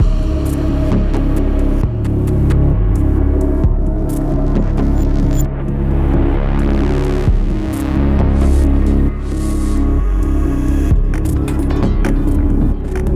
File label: Loading Generic; modern combat 5 blackout; android